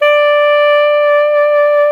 D 4, clarinettino; SoundBytes - Early Patches

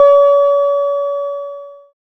SYN, Analog; Sound & Vision; Gigapack I CD 2

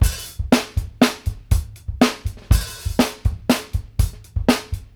open hat; Sampleheads; New York City Drumworks VOL-1

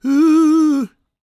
GOSP MALE, SOLO; Spectrasonics; Vocal Planet

